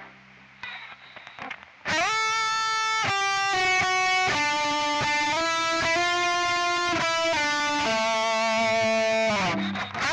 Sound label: Lead Out